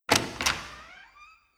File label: door; Lucius; SFX door delay